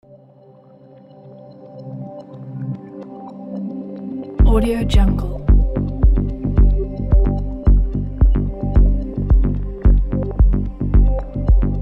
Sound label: minimalism